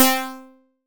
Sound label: Hit, Stab & Hit; Bitkits